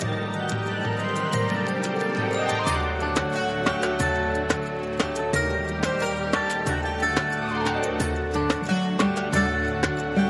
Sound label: Normal Bg Bgm